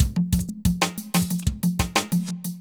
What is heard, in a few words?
conga hop 92